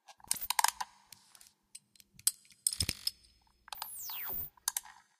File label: gadget; Secret Agent